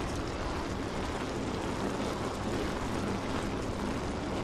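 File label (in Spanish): RODADURA YBR, motorcycle; YBR125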